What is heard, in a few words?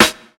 smooth steel snare drum d sharp key, Royality free snare drum tuned to the D# note